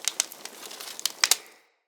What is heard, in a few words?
Campfire Crackle; Props